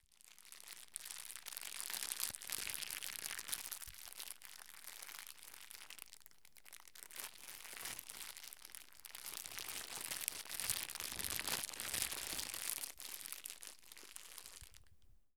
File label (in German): Stereoknistern; tascam dr-22wl